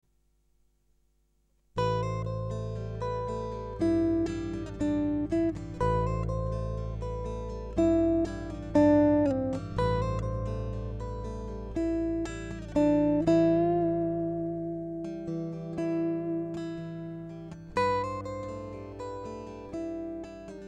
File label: AGEGAE 120 GTR arpeggios